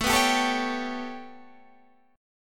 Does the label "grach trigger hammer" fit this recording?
No